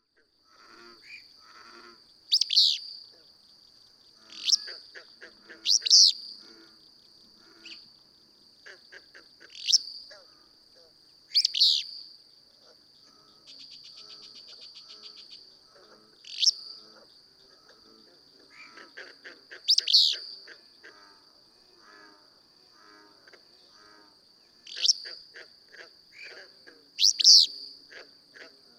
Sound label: Willow Flycatcher